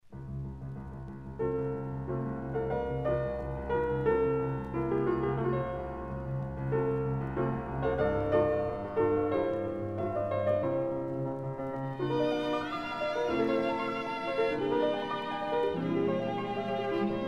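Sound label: piano trio; fibich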